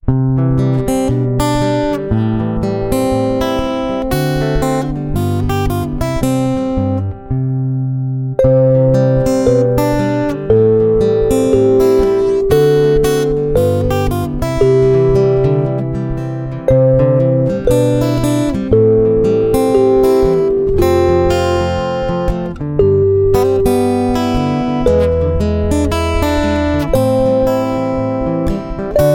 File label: Instrumental